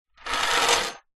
household jewelry wedding ring platinum slide table desk; zapsplat